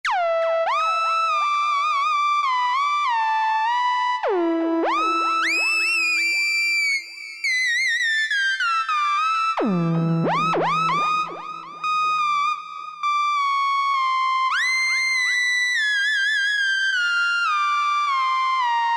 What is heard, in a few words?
Ld Rap Whistle, Yamaha EX5R; Factory presets